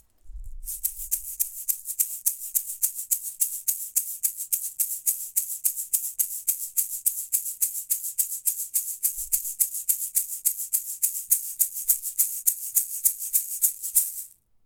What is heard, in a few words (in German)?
rasselei